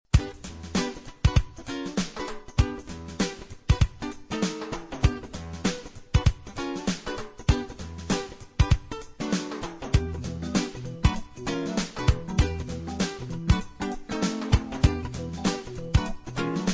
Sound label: Latin Groove